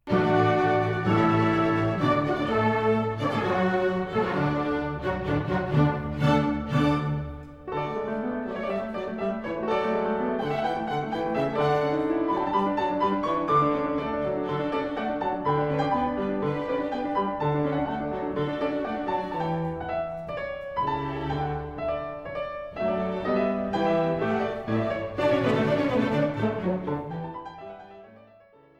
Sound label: Piano